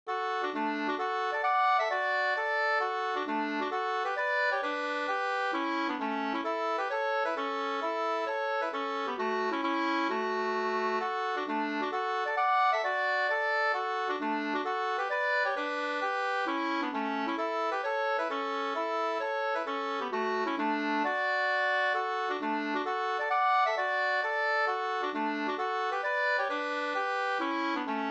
hambopolska

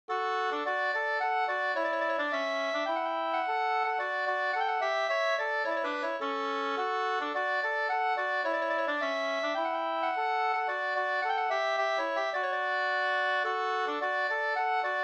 slängpolska, D-dur

